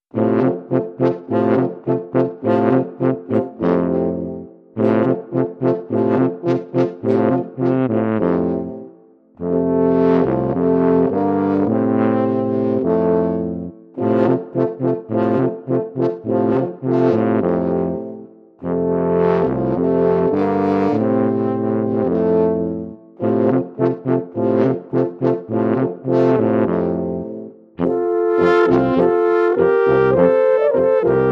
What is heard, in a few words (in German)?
Alphorn Hummeln